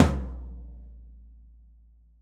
OH FloorTom single short

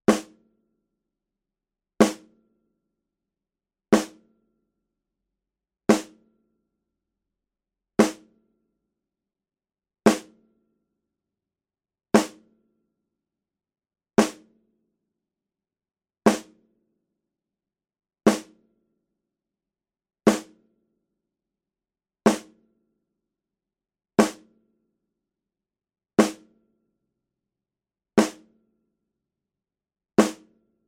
Shell Snare single

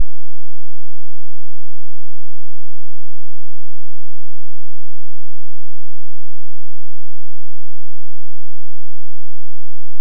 .5 Hz Square

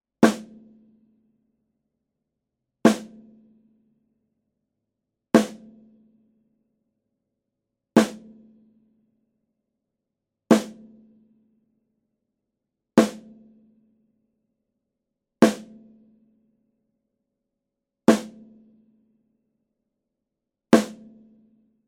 Snare single

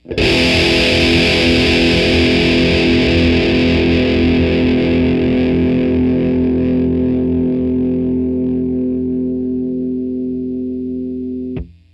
Electric VS40V Chords short

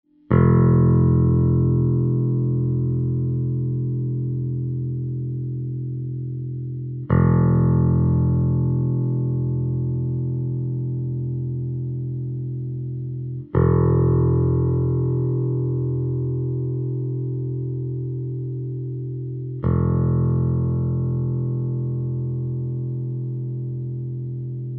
Bass Single Note; Presonus Jensen Xfrmrs

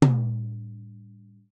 Tom Single short

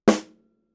Shell Snare single short; snare drum

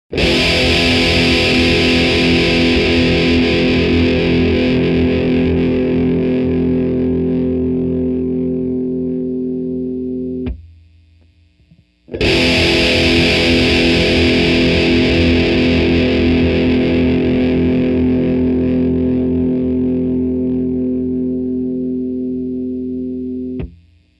Electric Chords

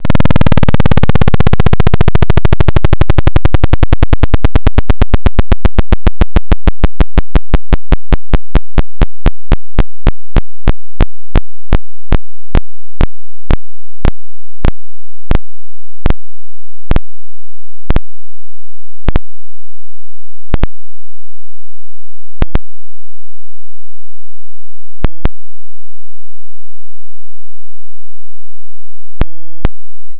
Pulse 10 Percent

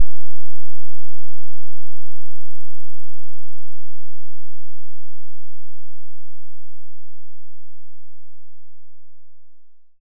.5Hz Saw Down

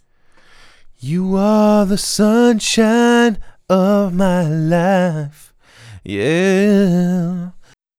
generic op amp

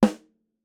CM Snare Single short

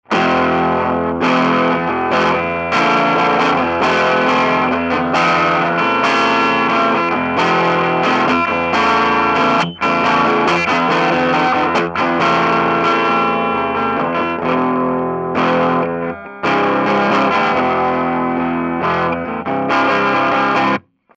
Electric Tube; Presonus Jensen Xfrmrs

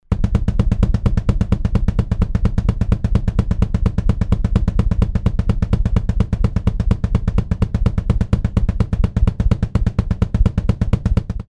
Bass Drum Double

